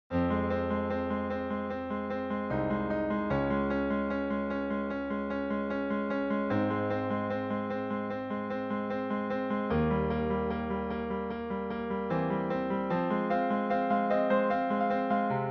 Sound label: Piano Solo